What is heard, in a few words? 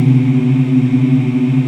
VOX AFMM, VOX Afro Mms, Africa